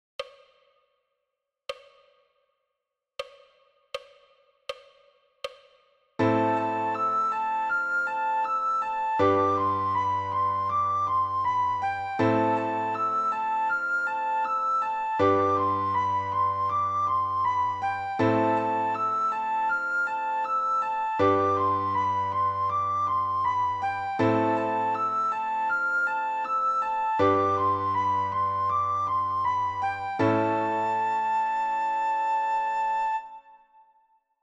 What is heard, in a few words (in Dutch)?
rustig sopraan; Flierefluiter 7